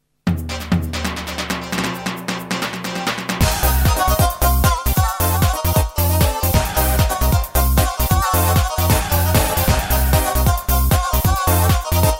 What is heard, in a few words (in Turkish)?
karadeniz 7-8